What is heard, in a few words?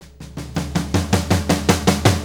Blues Fill; Sonic Foundry (Sony Creative Software); Loops & Samples Drum Tools; Fills